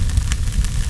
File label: Fire roar; Demo Man